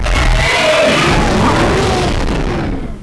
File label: death; Alien Arena; nemesis